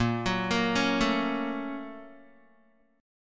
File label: Bb Minor Major 7th Sharp 5th, Guitar; BbmM7#5; arpeggiated; 6,9,8,6,9,x; JGuitar